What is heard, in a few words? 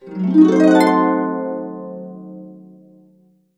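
C diminished 7, Harps; USB Soundscan vol.62; AKAI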